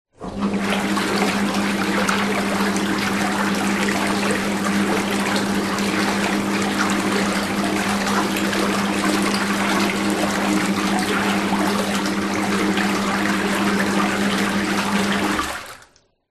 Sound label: household bath spa on run off short bubbles motor whirr room ambience; zapsplat